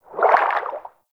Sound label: Personal Quarters Fish Tank Movement Quick; Warframe; Hub; Liset Personal Quarters